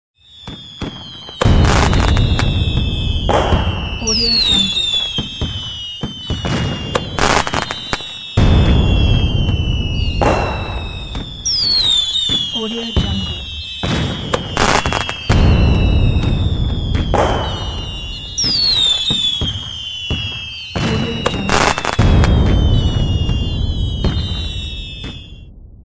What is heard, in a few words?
fireworks